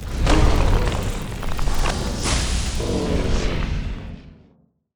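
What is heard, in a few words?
elemental death; monster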